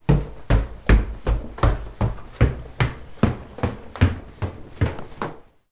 footsteps up wooden stairs small reverb

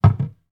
household bread bin lid remove

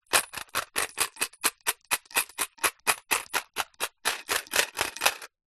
foley coins shake in metal container

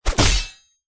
impact sword swipe into large wood hit

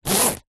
purse pouch leather zip; foley

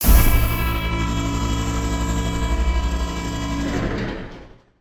science fiction space door slide internal; sound effects one

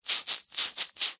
baby wipe wet squeeze squelch hand; foley

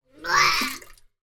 human child vomit into toilet

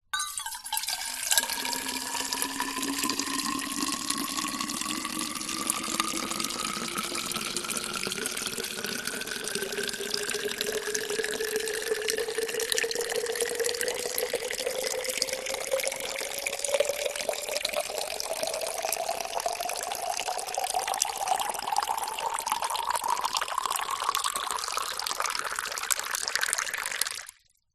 drink metal thermos flask fill with water slow

